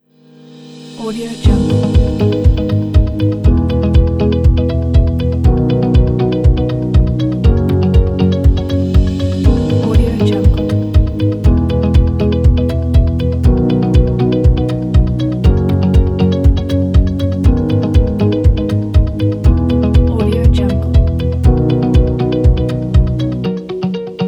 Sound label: corporation relax background